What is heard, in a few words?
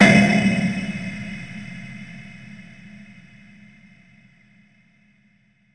big clank